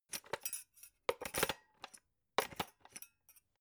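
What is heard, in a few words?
electric whisk patch handle metal, kitchen; haus-garten; kueche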